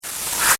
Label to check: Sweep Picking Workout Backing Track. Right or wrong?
Wrong